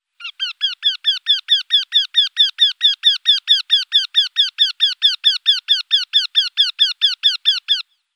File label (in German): Wendehals Jynx torquilla, Vogelstimmen